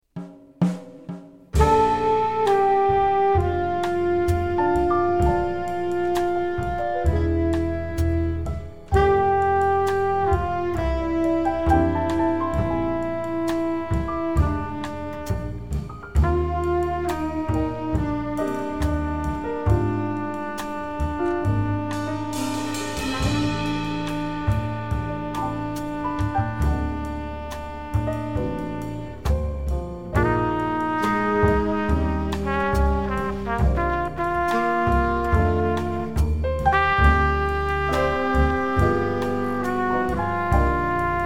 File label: complete mix